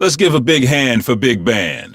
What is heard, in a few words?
bigband